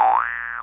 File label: trampoline; cstrike; blockmaker